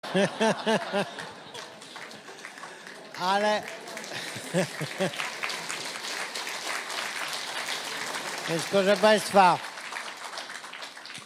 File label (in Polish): smiech; kaczynskiego